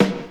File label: hip hop snare one shot g key, snare sound tuned to the G note; snare drum samples